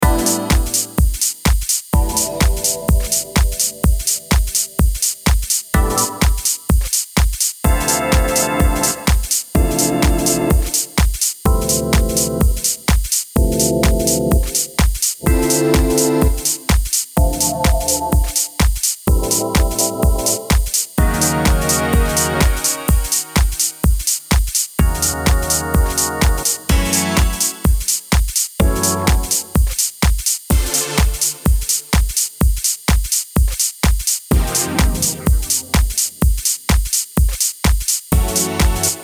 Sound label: one shots